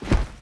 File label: fall; reaper; giants